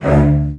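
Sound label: CELLOS E2, Strings; ILIO; Synclavier Strings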